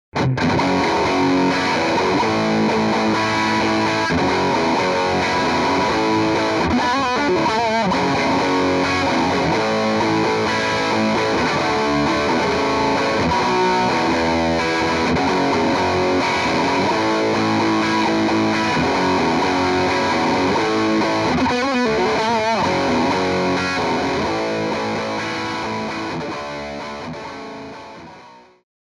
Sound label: Overdrive